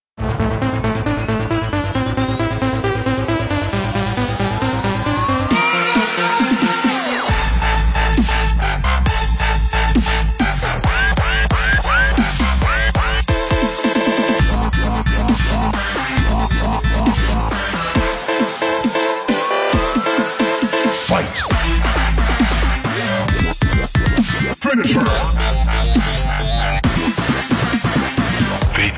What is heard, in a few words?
Dubstep Ringtone